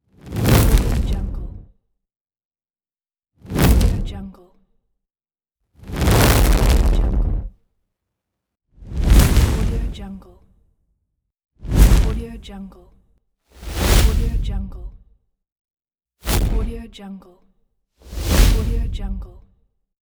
short fire whoosh

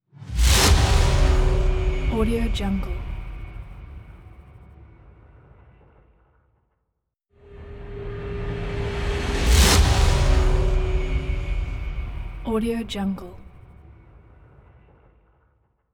whoosh

